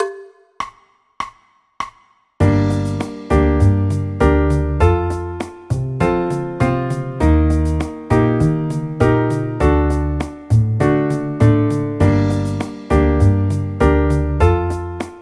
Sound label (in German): g moll akkordverbindungen